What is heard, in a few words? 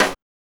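HSE-SD, house snare drum; X-Static Goldmine 1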